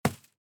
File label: hit